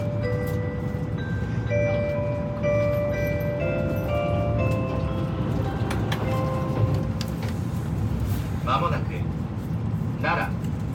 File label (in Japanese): 近鉄京都線奈良南車内接近